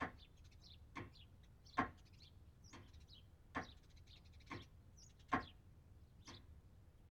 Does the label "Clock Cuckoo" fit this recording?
Yes